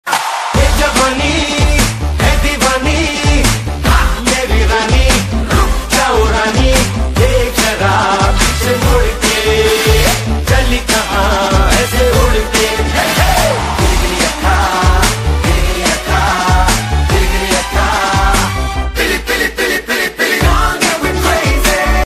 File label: bollywood ringtone